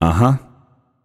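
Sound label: non verbal agree, voice; cernunnos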